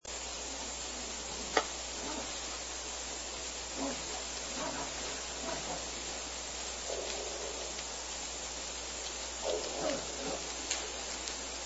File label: groans, fish; biophony